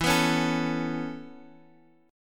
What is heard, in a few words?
Db Minor 7th Sharp 5th E